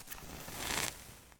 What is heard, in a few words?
thunderstick miss; wesnoth